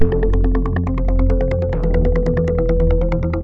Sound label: Synth Poly; TSMtoolbox